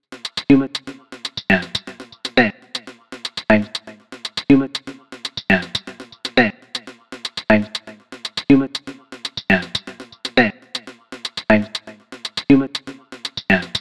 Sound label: XLN Audio Life Vocals